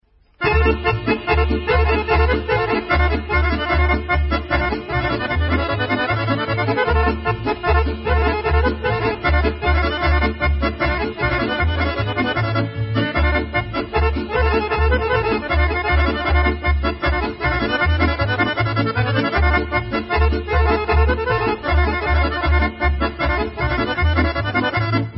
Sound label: Gocino Kolo Serbian